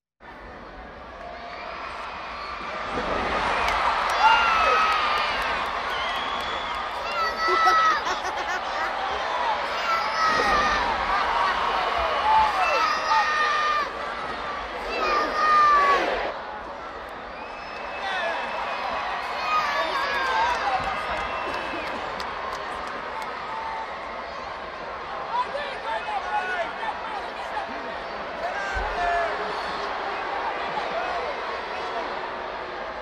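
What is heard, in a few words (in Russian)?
фон толпы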